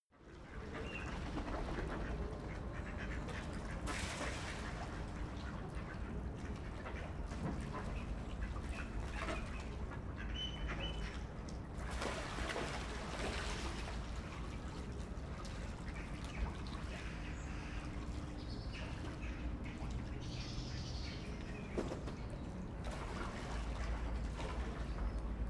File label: canal europe day ducks water